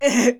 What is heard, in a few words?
horror ehe